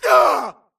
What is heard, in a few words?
grunt death quick; Titanfall 2